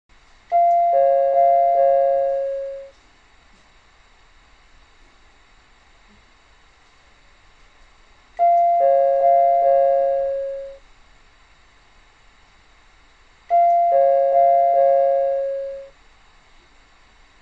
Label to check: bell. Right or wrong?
Right